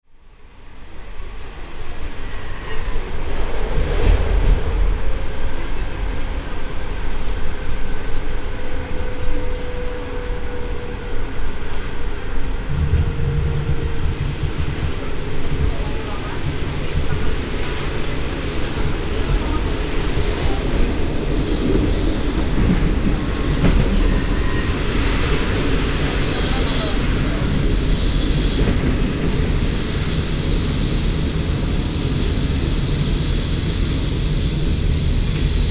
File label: Train Squeaks Halts; HradecNadMoravici; field